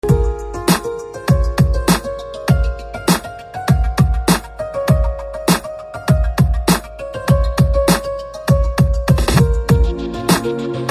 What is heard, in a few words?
BG love musik tone